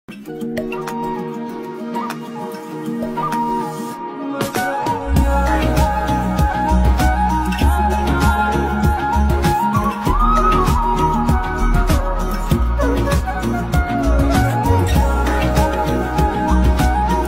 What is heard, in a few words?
Instrumental Ringtone